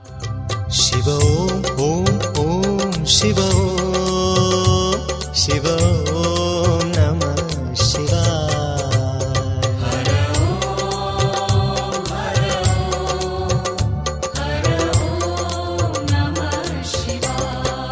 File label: Shiv Om Om Om Shiv Om